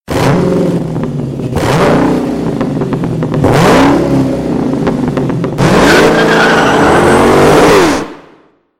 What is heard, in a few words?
Challenger SRT Hellcat; Challenger SRT Hellcat Ringtone